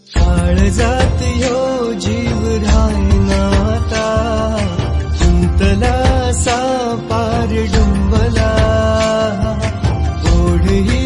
Marathi Ringtone

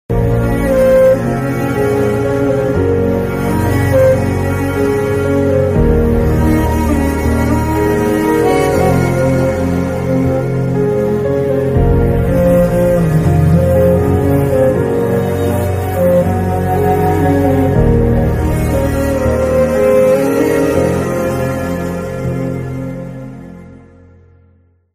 instrumental; kgf movie

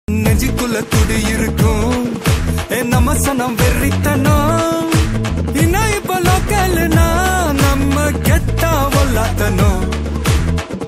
Tamil Movie Song Ringtone; Bigil

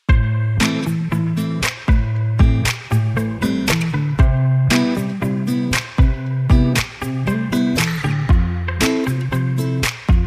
Instrumental Song Ringtone

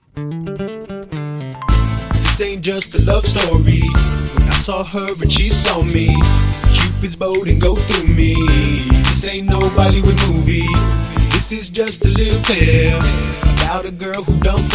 Very Nice Guitar

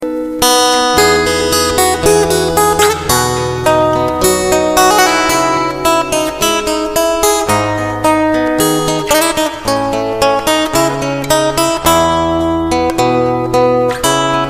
Acoustic Instrumental Ringtone

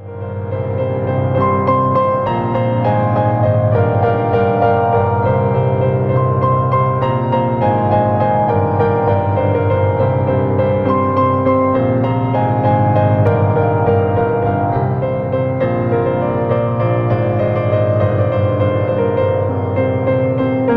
Best Telugu Melody

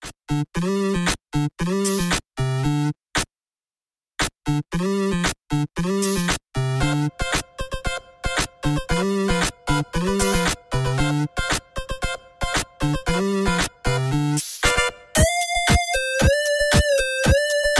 funk mix

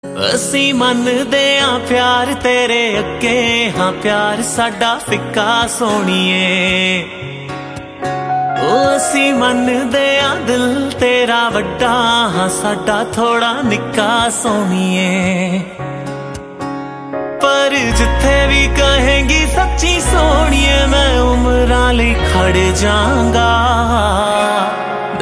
bollywood ringtone

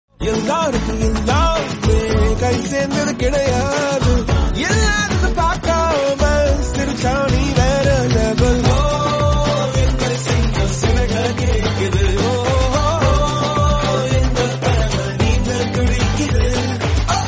Tamil

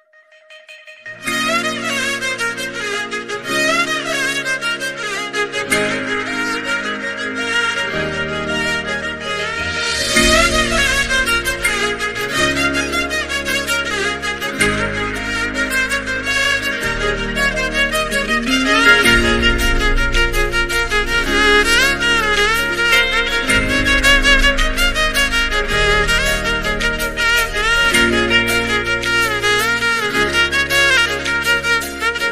Telugu BGM Instrumental

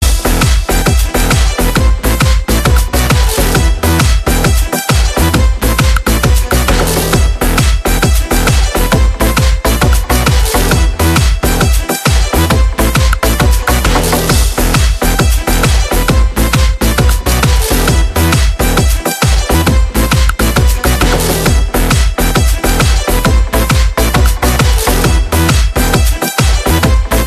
Disco Dj Remix

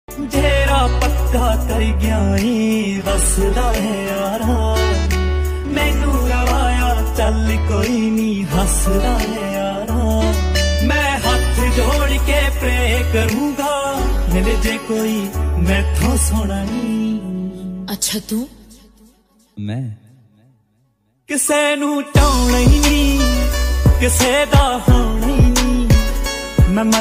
Sad Song Ringtone

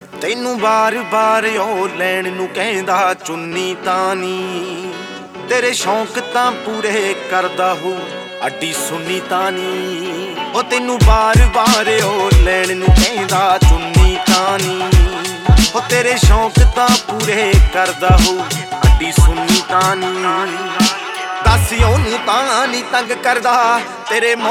Punjabi

